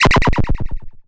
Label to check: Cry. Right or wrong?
Right